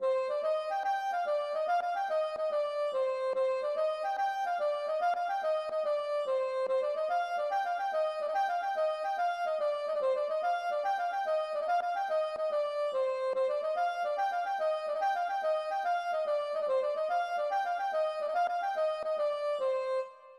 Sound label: gavotte; Kledennpocher